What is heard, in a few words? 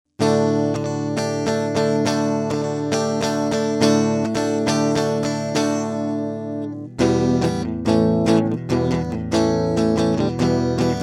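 Audient id44 mkII guitar filter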